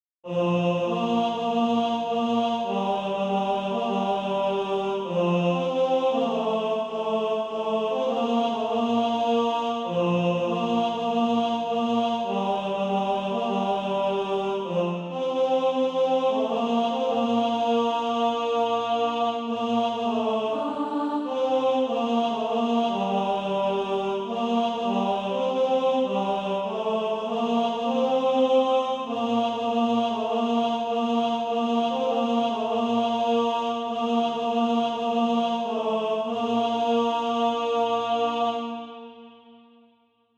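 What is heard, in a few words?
tenor, Practise Music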